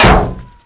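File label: ricochet; firearms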